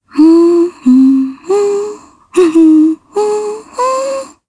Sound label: Vox Hum, jp; voices; heroes; Jane; kingsraid